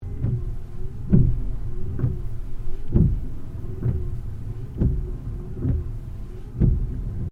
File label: Wipers Slow Interior Loop, car; Hyundai Accent 2008; Hyundai hire car; Vehicles